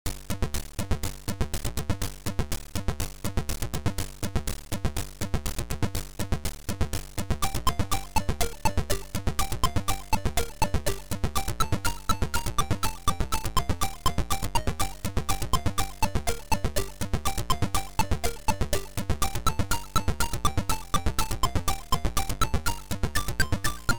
Old Tower gameplay tune

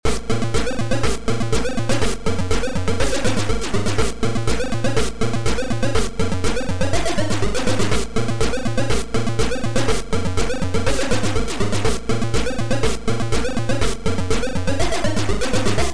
Beat; Ironman

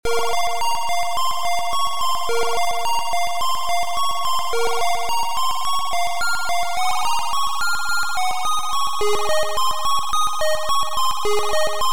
Sea war ZXS48K beeper

